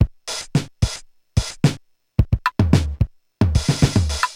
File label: Korg MR-16 (110BPM), beats